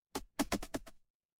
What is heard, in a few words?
retro game hit